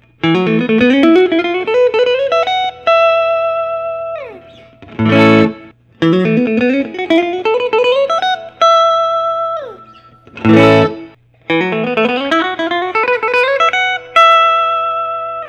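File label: monty bluesmaster korina natural, guitars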